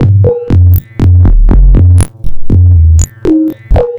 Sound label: Structured Rhythm, IDM Rhythms